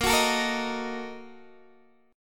Bb Major 7th Suspended 4th Sharp 5th, Guitar, Open D